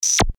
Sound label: syntetic zap